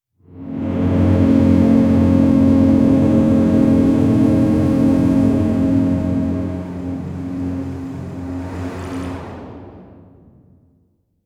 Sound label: small soft warship destro, marine